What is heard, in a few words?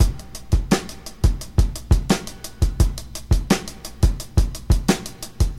86 bpm breakbeat f sharp key, kick tuned to the F# note. Loudest frequency: 1044Hz